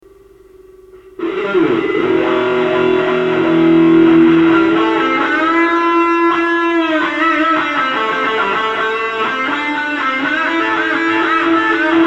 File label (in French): impro en son disto